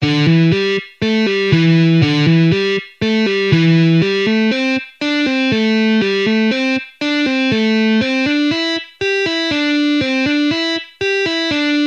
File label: Essential Lead Playing Exercises